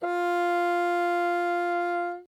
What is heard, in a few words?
Bassoon ff F4